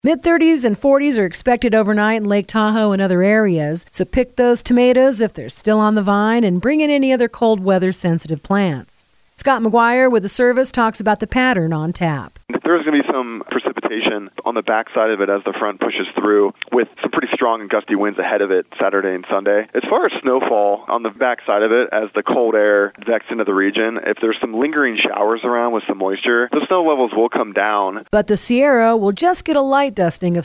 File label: rap